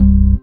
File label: DM ORG1, Dance Mega Synth